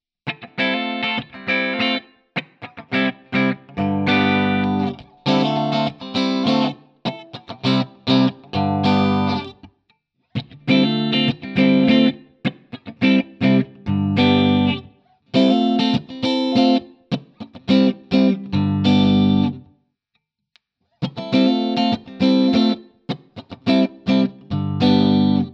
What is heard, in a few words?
clean, gibson les paul standard